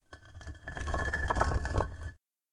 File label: Deep Rumble Walls; SyrinScape; Hunting horror battle